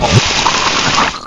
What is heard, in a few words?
hurt; AoMDC; monsters